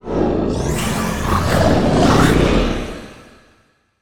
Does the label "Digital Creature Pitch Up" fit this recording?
Yes